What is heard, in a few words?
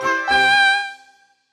Beats Pickup; Sparklite